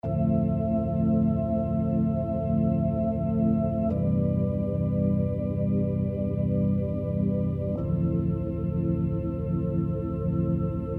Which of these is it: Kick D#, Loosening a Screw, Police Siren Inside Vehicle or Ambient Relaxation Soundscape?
Ambient Relaxation Soundscape